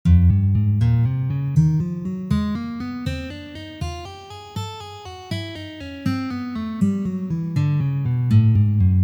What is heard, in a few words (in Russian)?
Trenirovka na gitare